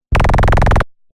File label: Laser Like Synth Rapid Laser; sound spark